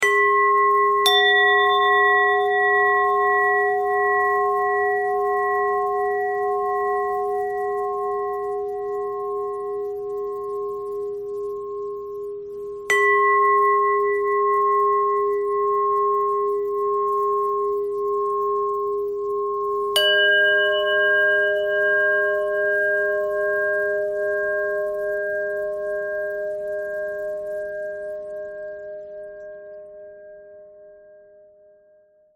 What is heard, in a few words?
bell thread pentatonic